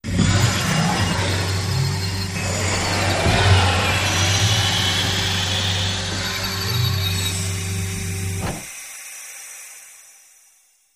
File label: Isolation Room Door, pneumatic